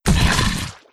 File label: Death